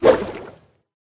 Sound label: hydra dodge